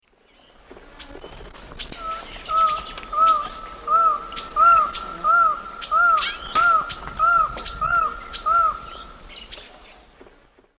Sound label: Micrastur mirandollei, song; S. America; Eastern Lowlands